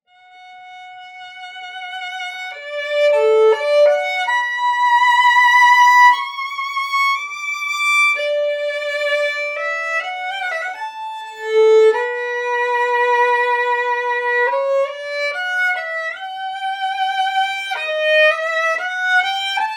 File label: Violin; Meditation from Thais; Conservatory